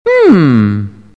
hmm; mario bros

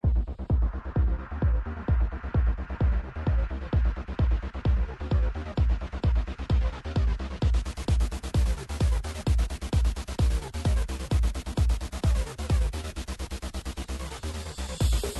percussion; virtual dj

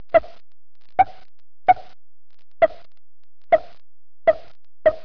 cartoon

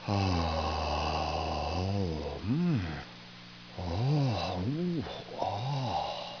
people

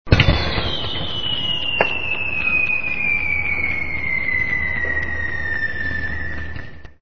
fireworks

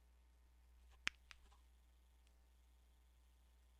pop

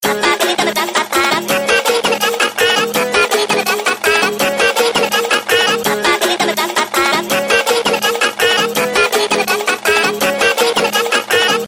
funky ringtones tone

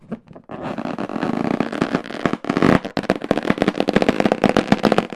ready to burst

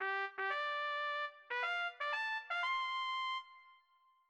short triumphal fanfare

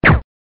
gun silencer

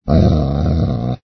ANIMAL DOG

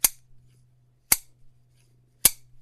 metal clippers

